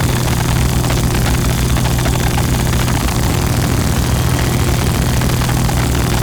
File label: Ethereal Void Angel Beam Loop; Abilities; Warframe; Zariman